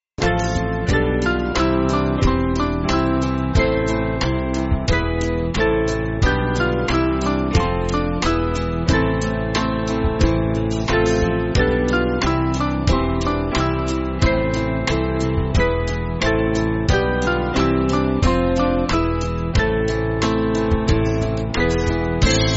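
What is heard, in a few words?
Music Backing Files for Churches; We Pray Thee; Caerlleon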